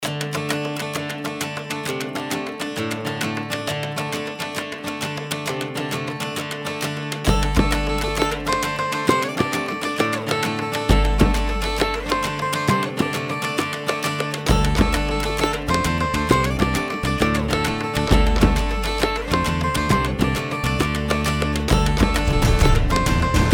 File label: Irish jam